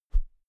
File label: whoosh thick low soft; swooshes-swishes